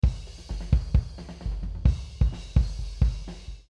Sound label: Multimic Facets Kick Resonant